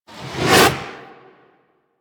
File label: Leap Whoosh